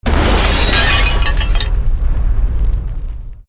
EXPLOSION MEDIUM METAL GLASS; Created explosion effects, house, building or vehicle effects; Bombs

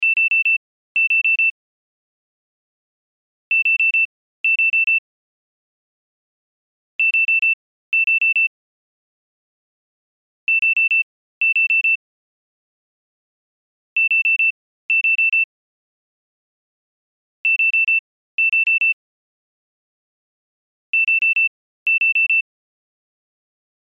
Pager Beeps Alarm Loop; Technology; Multimedia; Blastwave

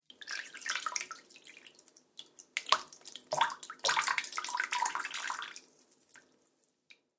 Water Trickles And Plops; Dripping Sound Effects

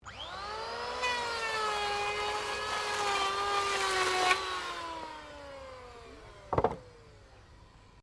POWER TOOL ELECTRIC PLAIN WOOD; Various power tools

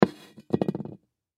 Pot Set Down; Blastwave

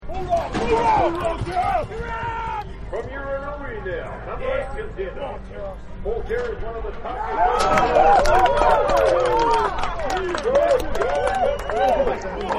Rodeo Starting Gate; Partners In Rhyme

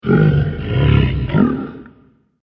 Massive Dragon, monster

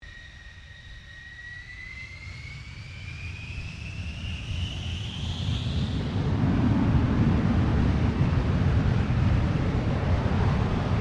AIRCRAFT JET FIGHTER F-111 TAKEOFF; 1973; F-111 Jet Fighter Bomber; Airplanes; Vehicles

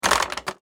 Household Toilet Paper Roll Dispenser, bathroom

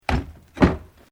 SKI BOOT PUT DOWN; Snow Skiing; Sports

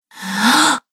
Woman Gasps In Fear; Gasping Sound Effects

